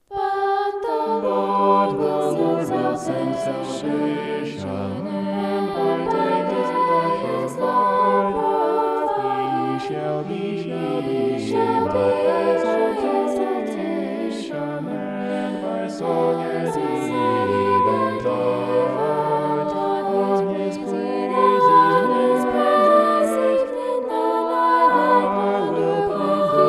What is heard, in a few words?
vocal poly; psalm 42